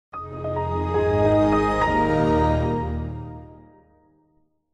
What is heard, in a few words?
Microsoft Windows XP Startup Sound